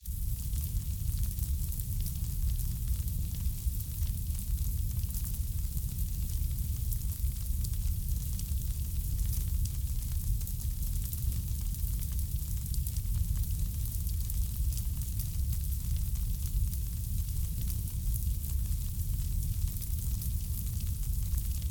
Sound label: Fire burn Forest Fire Artificial Full